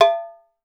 timbale, cowbells; Voice Crystal - Steve Reid Definitive Percussion

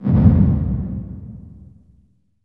LH K909, REVRB LARGE HALL; TR 909

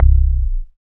BAS FM DX-7

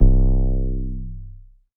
SNGL.BASS, Jungle Rave

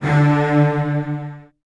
D2, Virtuoso Strings, 16TH; ILIO; Kirk Hunter; CL 16TH

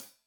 Hat Loose, Hi-Hat, Cymbals Stereo

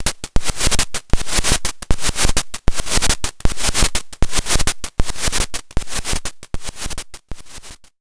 VINYL, FX, Analog; USB Soundscan vol.49; Analog Fantasies; AKAI

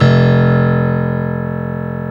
PNO E PNO, Electrics; Club-50 - Foundations

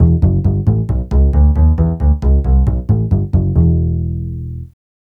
TV Suspense Bass-E, 135; Zero-G Kocktail Kollection; Construction Kitz (Lounge); TV Suspense Mixes 135

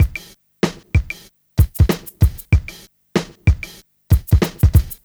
Smooth, R&B Drums

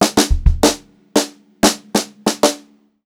fill, Brushed Drum Loops

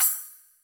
C Splsh, Drum Machines, Yamaha DTXpress, Cymbal

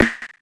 Snare Analog, Yamaha RM1X; Drum

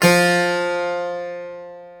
harp F3

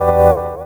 Chords Tones; Equipped Music; Loungin House

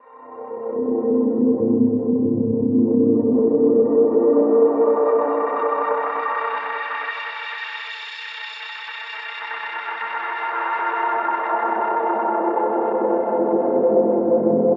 130 A# Curious Pad

